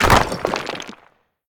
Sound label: wall destroy; Smite; Cabrakan